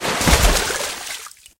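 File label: clean soft sea wave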